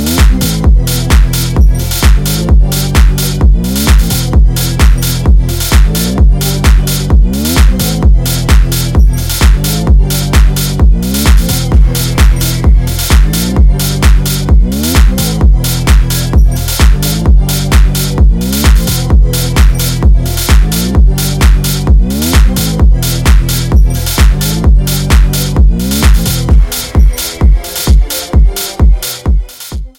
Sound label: bass fonk